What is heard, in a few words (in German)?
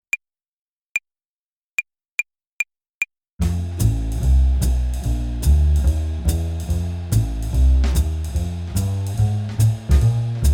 Blues-Tonleiter Übung, Play-along Fast, Klavier; Blues-Tonleiter Übung 1 bis 6; Jazz Club Klavier